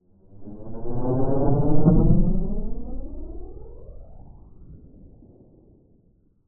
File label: Passing Space Ship - Buzzing Engine - Accelerating; Hollywood Edge; sound designer's toolkit v2